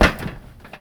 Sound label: metal grate, footsteps; player